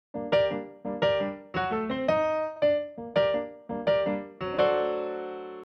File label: Acoustic Piano